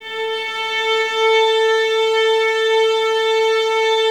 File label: violins A 4, string ensembles; Miroslav Vitous; 11 Violins